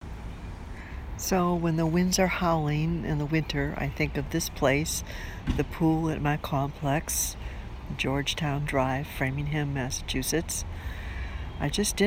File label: pool